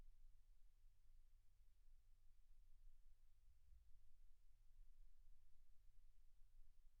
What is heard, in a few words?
silence 48kHz